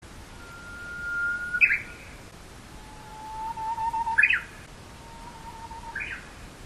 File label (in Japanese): uguisu